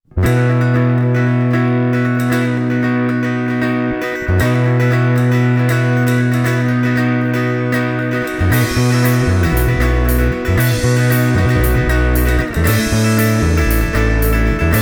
Italia Mondial Classic live doubling; puoliakustinen sähkökitara

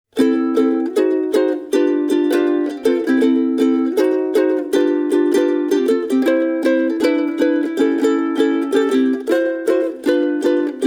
Tanglewood TU 1 solo

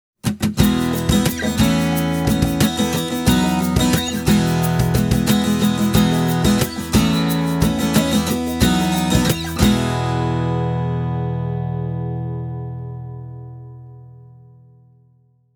Westerngitarren Tanglewood TW28 CLN; Rockway

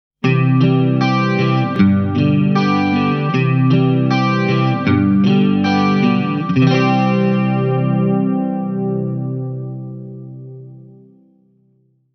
Marshall Code 25 clean

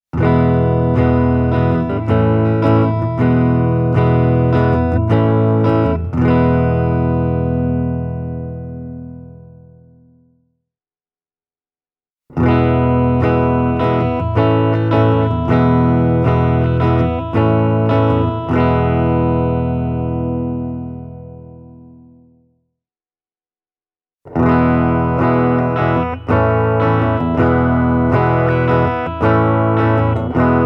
Epiphone Ltd Ed Korina Flying V clean

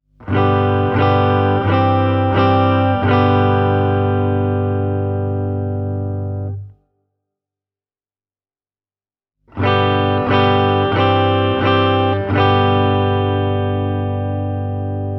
Green BB clean; puoliakustinen sähkökitara

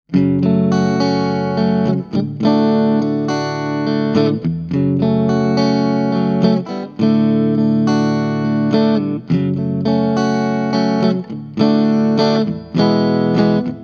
Orange Crush 20RT clean

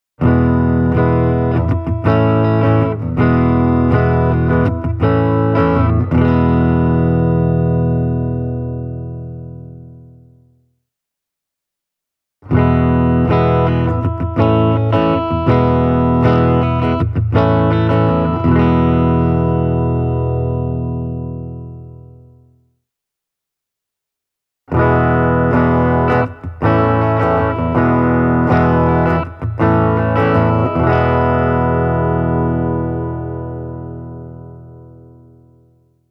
Tokai ALC-50JS clean

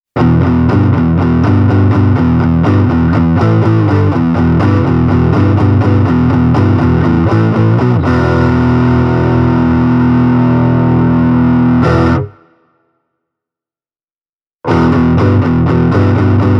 Schecter Omen S II distorted

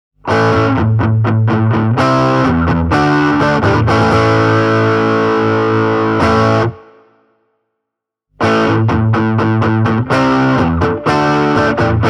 Green BB overdrive; puoliakustinen sähkökitara

